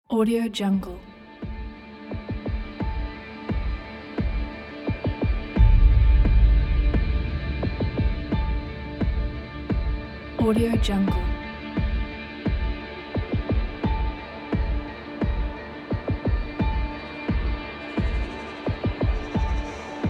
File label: powerful cinematic trailer